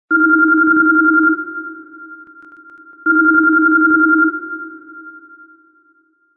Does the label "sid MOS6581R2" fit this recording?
No